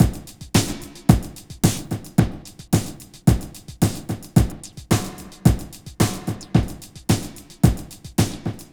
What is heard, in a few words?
Basic, beats; Sonic Foundry (Sony Creative Software); Ugly Remnants VOL-2